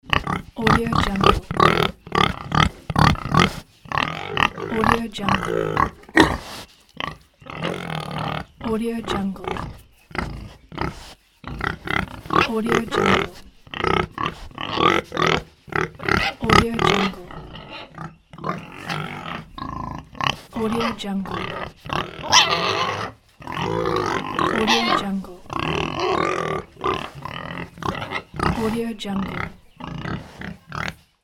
pigs

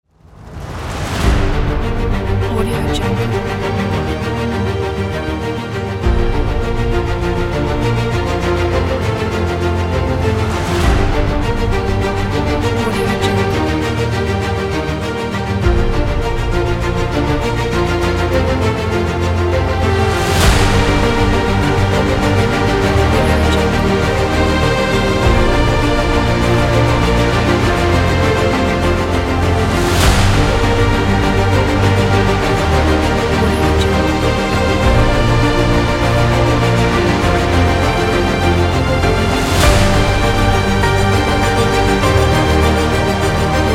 epic inspiring

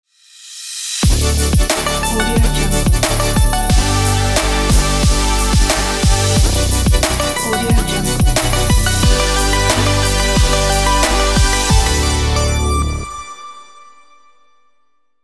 positive future bass logo

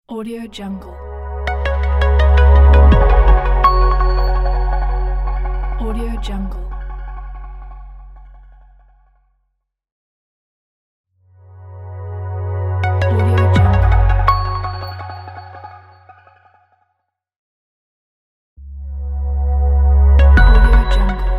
marketing logo

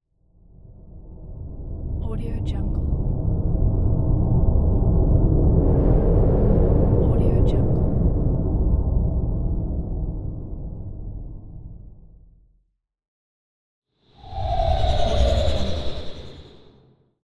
spaceship flyby

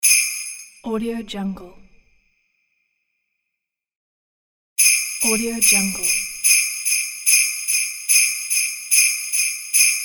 christmas jingle bells

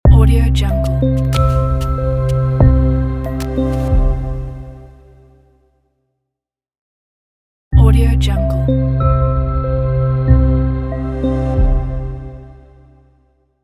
simple logo

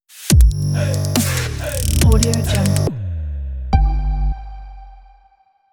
urban life logo

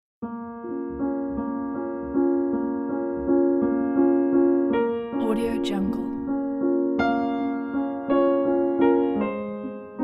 sad dreamy piano

